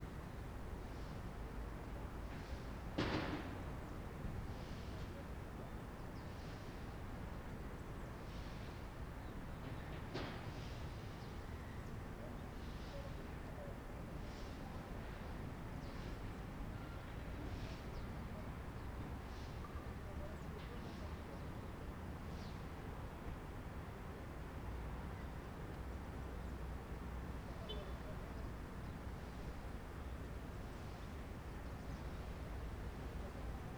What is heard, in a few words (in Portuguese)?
Ambiencia estacionamento unb com transito longe, vassoura varrendo; e pessoas longe; universidade de brasilia